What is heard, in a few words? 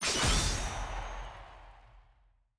ui map difficulty selected